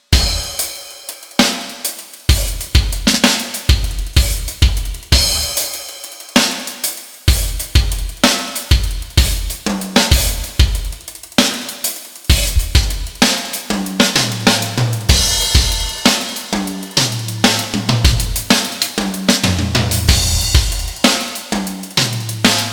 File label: verb test Glossy Plate